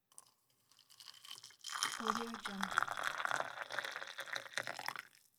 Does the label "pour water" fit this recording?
Yes